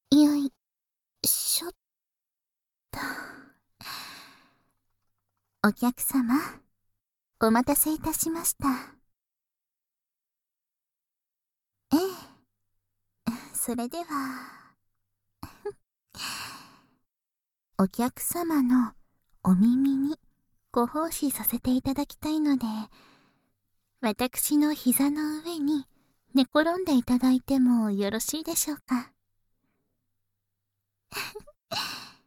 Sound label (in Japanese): 耳かき両耳（バイノーラル 効果音なし）